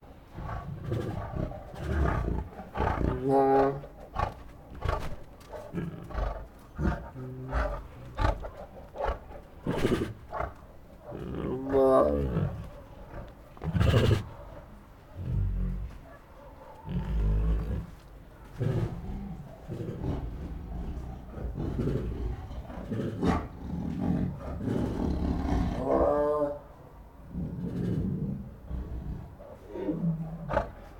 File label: Two Tigers Make Many Restless Breaths, Groans and Pants; Sound Ideas